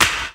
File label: short snare sound E key